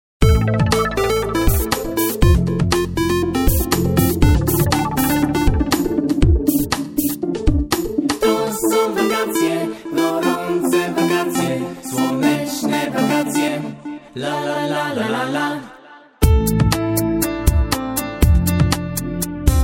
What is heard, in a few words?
Instrumental